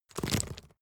stone drop small stones hard

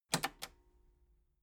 metal button cassette rewind fast

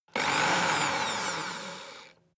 servo small on off

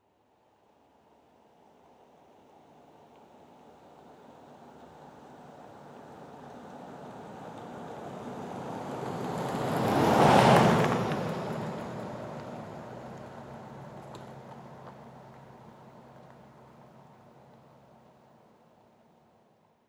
jeep cherokee pass slow

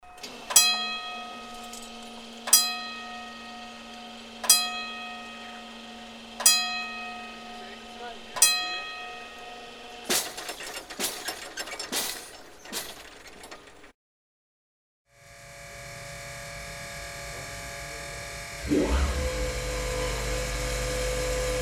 container port

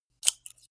scissors open close

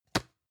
plastic can small lid close hard

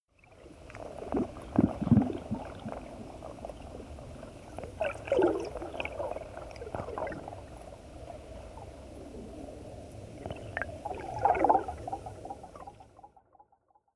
water fx gurgling

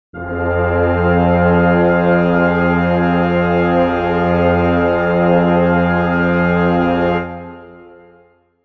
brass chord f minor

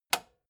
metal button spring push

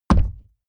horse step wood

